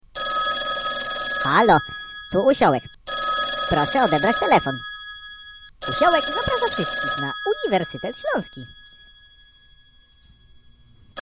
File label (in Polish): dzwonek